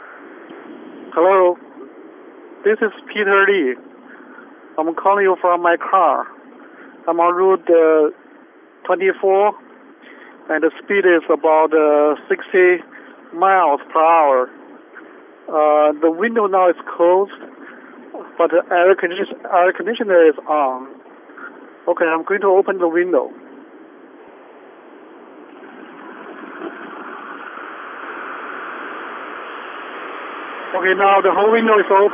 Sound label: car; noise reduction mobile phone